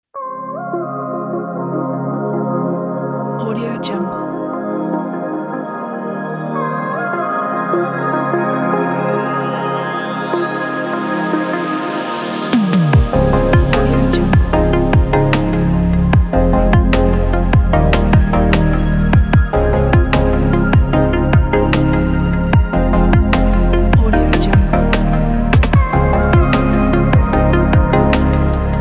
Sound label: chill pop